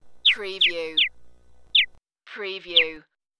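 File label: Bird Chirp Alien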